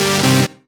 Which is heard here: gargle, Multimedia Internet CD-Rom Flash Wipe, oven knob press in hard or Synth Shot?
Synth Shot